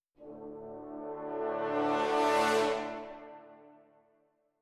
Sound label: horns swells; Netease; kill boss phoenix